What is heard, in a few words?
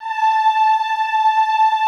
OOH A4, Classical Choir; Best Service; Extended Classical Choir; OOH MIX